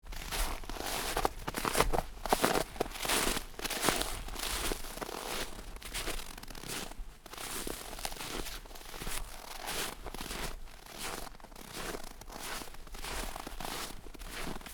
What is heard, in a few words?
footsteps snow; foley